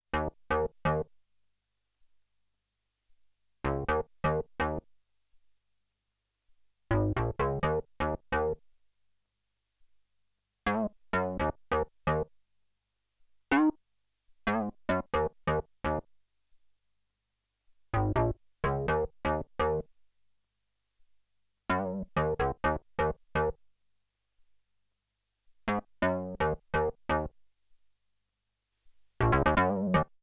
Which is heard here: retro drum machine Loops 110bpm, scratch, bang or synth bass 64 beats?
synth bass 64 beats